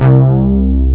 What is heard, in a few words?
Synthe Bass